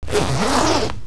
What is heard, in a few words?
ZIPPER